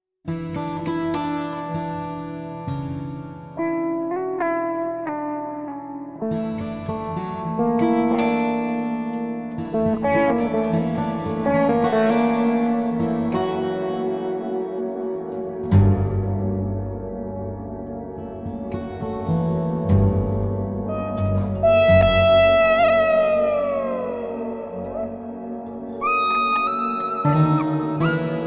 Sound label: distant chime